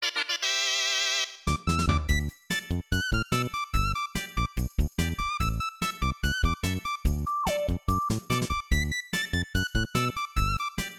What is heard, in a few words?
Win Theme; DM64